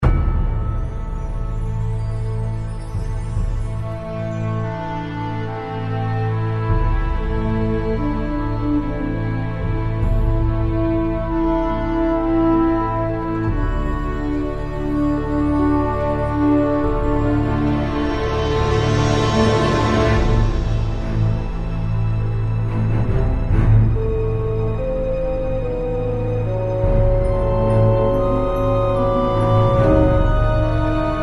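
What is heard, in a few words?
background music prebattle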